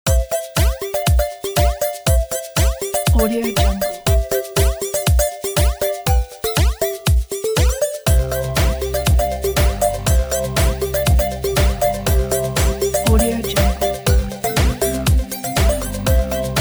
retro 8 bit magical adventure; applehillstudios

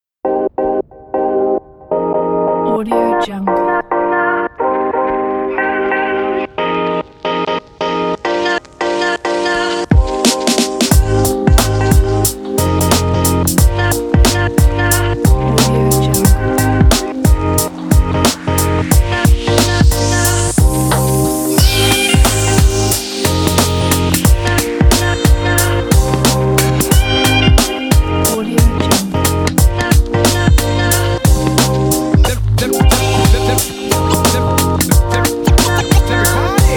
hip hop

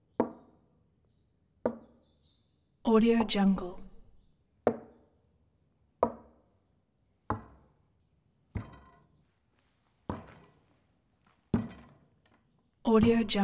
stall door knock fist pound kicking heavy wood

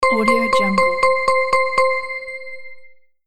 ecommerce counter

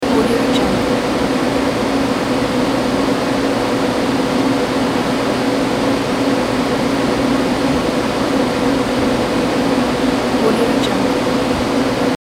control room

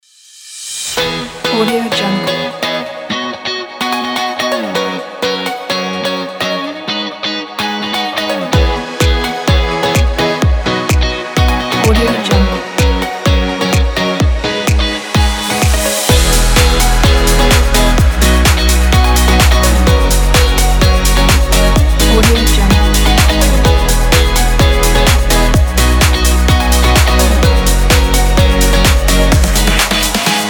happy

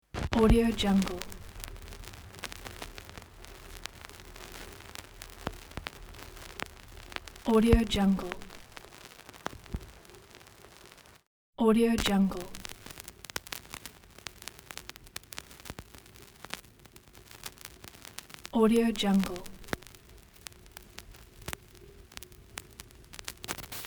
vinyl noise

